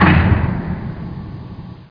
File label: CLANK